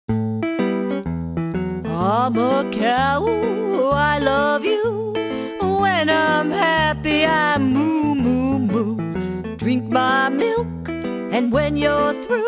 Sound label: Cow Song; JumpStart Toddlers